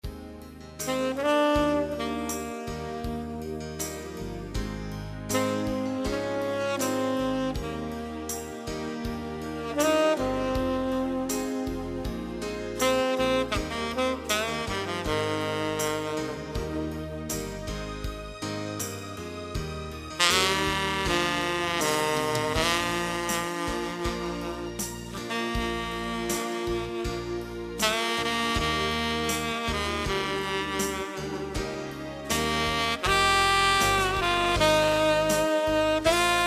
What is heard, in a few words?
sax; Bridge